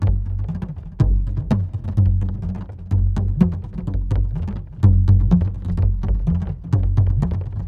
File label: PERC LOOP ETHNO 125; eJay